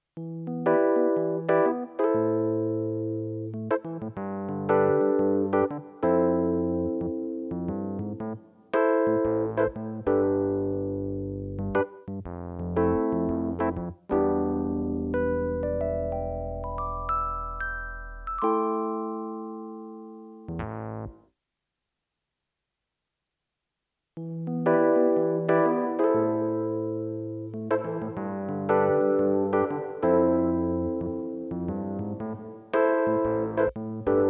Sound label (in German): Rhodes mit div Hall